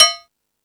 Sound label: Percussion, Drum Machines E-MU Pro-Cussion